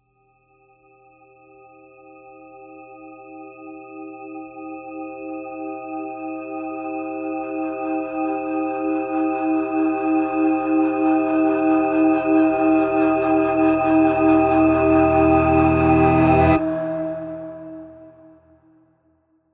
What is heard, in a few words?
Trailer Tools Tonal Riser; Production Music; CATastrophic